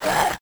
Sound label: skel hit; Crypt of the NecroDancer